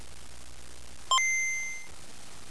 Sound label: turned on gameboy